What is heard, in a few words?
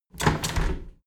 window handle squeak turn close heavy; objekte; fenster